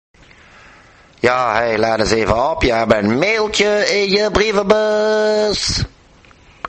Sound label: mail alert; ringtone